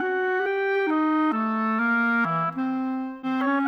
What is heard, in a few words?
Clarinet Oboe, Orchestral; Sonic Foundry (Sony Creative Software); Orchestral VOL-2